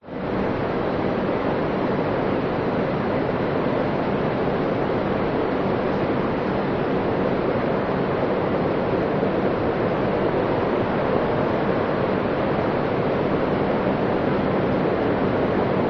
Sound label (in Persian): sedaye darya